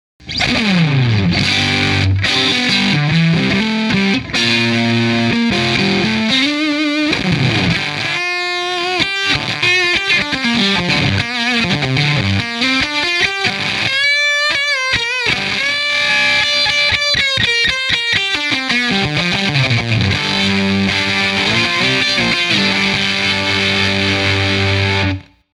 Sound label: TS Buffer Fuzz Face JTM50